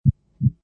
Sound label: Heartbeat Single, Single heart beat up-close or stethoscope; Can be looped or played at suitable intervals; LynneMusic